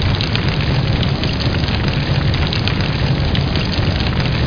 MIX RAIN; CHASM

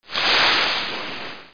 SEA WAVE

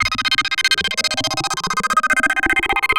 SYNTH D+B 160 C, Drum+Bass, Keys; eJay

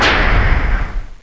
bash it; Total Chaos Nukem 3d Pack; duke

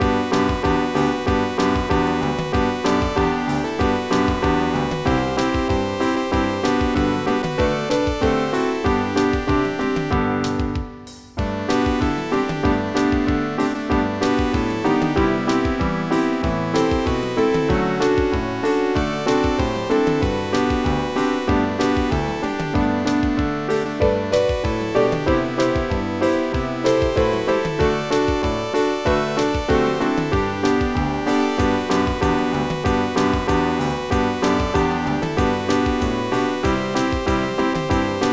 jingle b; Letters From Santa

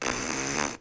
guy tongue

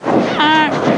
Goose

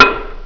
Player miss; Finder Dungeon

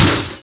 GLASS

